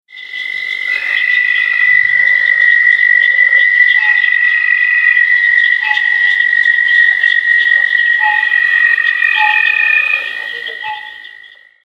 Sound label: Southern Toad; herps of NC; anurans